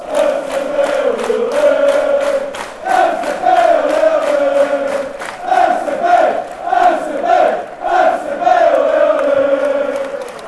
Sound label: fcb ole ole